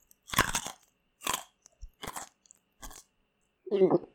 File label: Item Eat Crunch